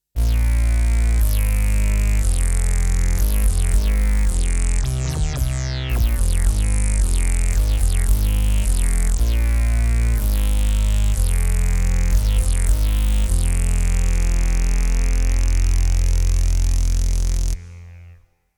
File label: Emax OB 1 Bass